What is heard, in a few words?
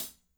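closed hi-hat, NATURAL KIT; Spectrasonics; Burning Grooves CD2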